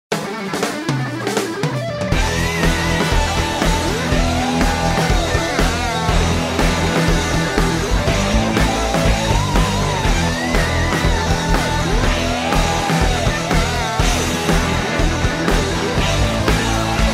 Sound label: ROCK HRD SCATHING; royalty free music; podcast production toolkit